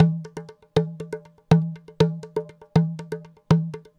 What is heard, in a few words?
SMALL DJEMBE; Sonic Foundry (Sony Creative Software); Vir Unis Infusion